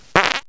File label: fart